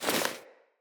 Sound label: Foley Action Default Melee Swipe, Default Clothing